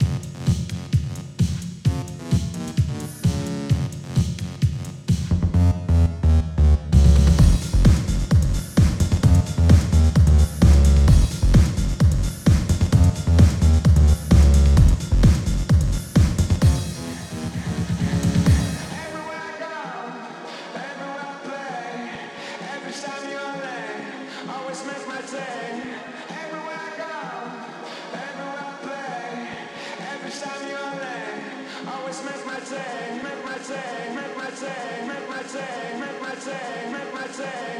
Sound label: keygen song; sven